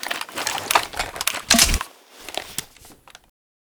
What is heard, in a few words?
rg6 close, weapons